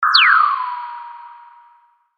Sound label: magical; maoudamashii